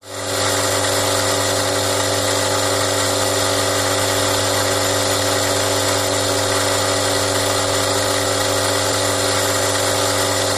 industrial machine water purifier ionic systems pump operate long